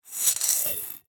Shake In Glass, Medium Wine Glass

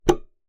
Key Noise, Melodica

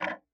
Tick, Clocks Kitchen Clock

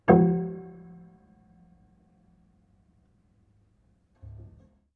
Harm Low Short, Piano Harmonics

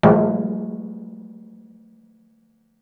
Oil Drum

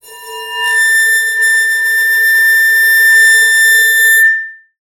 Bowed, Large Wine Glass

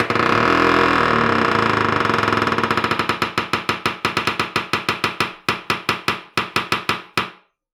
Door Creak

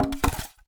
Polystyrene Rattle

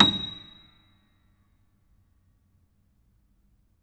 Note High, Piano; Piano Miscellaneous

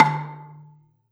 Gambang Hard Beater, Gamelan

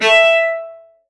Cello D Bass

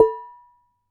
Damped Hit, Glass, Large Wine Glass

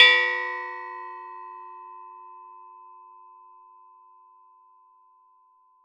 Metal Teapot Stand Strike

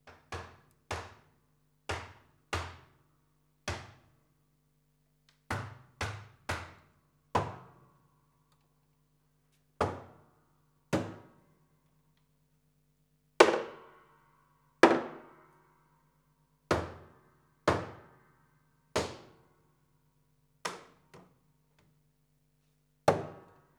Body Thump, Piano Destruction

